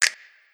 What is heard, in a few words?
Reverb Snap